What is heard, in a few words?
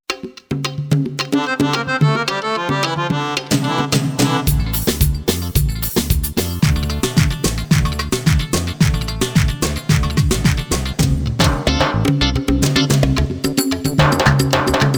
HALFAWI, Styles